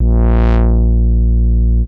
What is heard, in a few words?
velcro, Synths